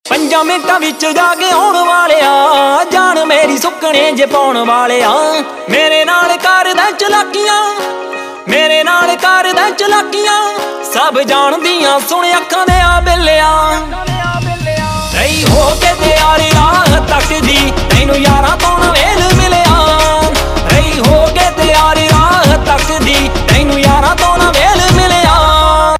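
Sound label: Ringtone, Punjabi Pop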